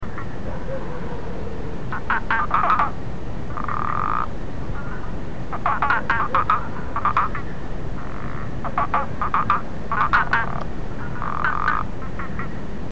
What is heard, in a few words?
Hypsaboas prasinus; Monte Verde; MG